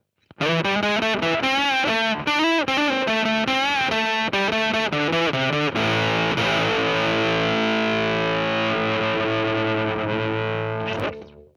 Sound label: Octave fuzz